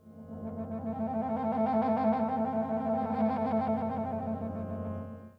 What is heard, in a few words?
Sax Tremolo